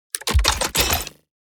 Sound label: Sci-fi weapon reload gun reloading